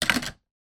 tennis racket place down hard surface; sport

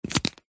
office pen felt tip lid on

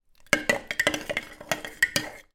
teabags and water in teapot stir with metal spoon; food drink

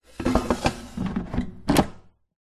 air fryer pan insert

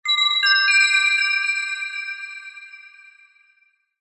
twinkle mysterious metallic chime

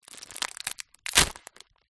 food microwave popcorn packet plastic open

